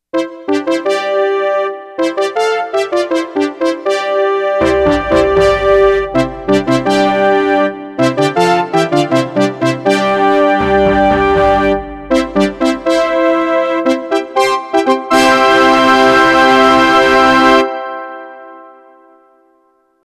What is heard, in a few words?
fanfare; ultima